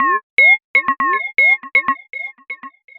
Alien birds arp 120bpm

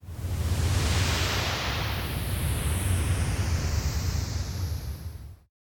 Whoosh With Pan

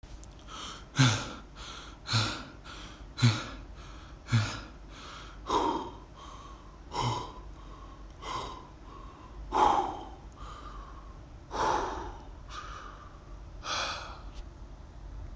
Breathing Fast To Slow

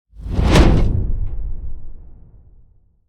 Cinematic Woosh

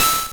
8 Bit Soft Beep Impact

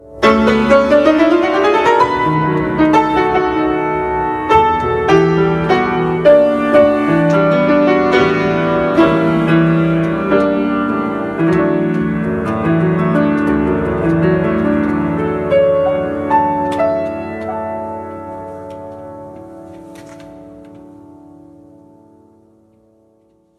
Piano Improv Romantic

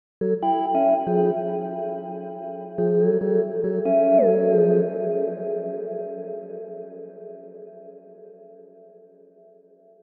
Strange Ambient Synth Line 140 Bpm

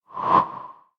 Whoosh Short

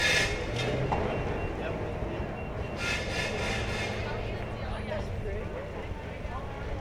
Street Sounds

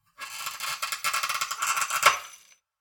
Ice Breaking Ice Breaking Shattering

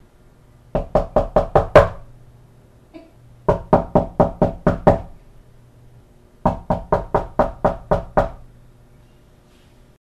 Knock On The Door

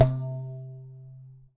Elastic Band C Note

